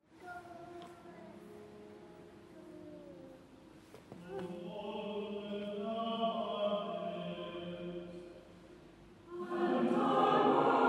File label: preces sung by the priory singers, Truro Cathedral; richard shepard